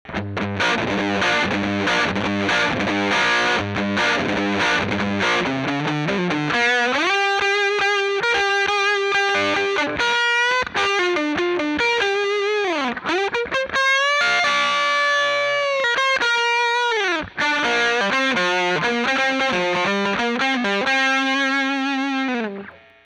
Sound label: 57 Dirty Guit